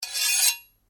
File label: Blade Scrape Medium, Sword; Foley sounds for melee weapons; Swords (Sound Effects:Combat)